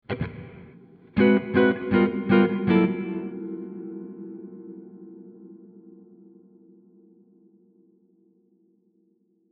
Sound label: Guitar Chords AR Envelope Comp Expand Output; U-He Twangstroem